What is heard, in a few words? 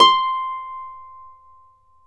Celtic Harp, Plucked Strings; E-MU Producer Series Vol. 7 – Old World Instruments